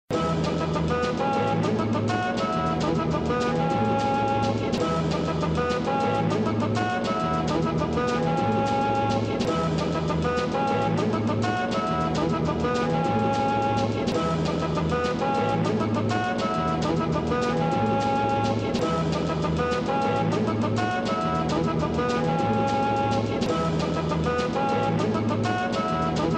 Danger loud, ringtone

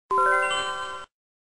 im chime

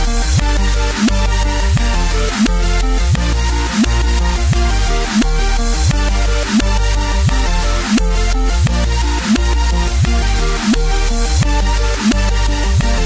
My Heart Reverse

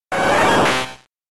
Flareon Cry

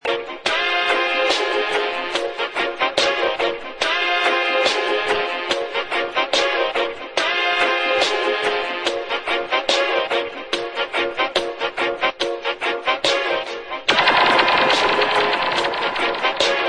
dubstep remix

